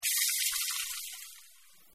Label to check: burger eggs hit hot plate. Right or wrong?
Right